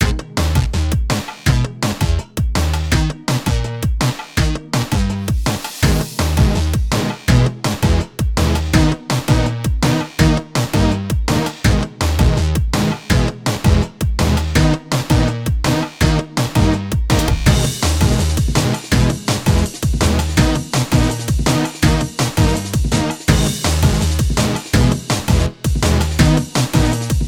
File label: Instrumental; Undead